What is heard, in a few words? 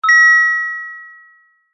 multimedia alert chime ping high pitched